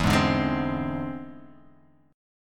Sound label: E Augmented 7th E down Guitar Half Step Down